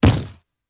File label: Camera Flash Pot; Camera flash, flash pot, sharper; cameras